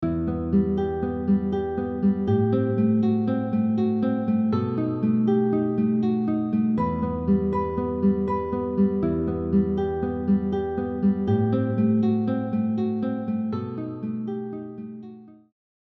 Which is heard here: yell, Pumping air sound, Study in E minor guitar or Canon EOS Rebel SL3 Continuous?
Study in E minor guitar